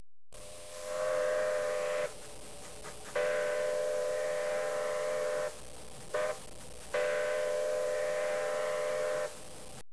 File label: train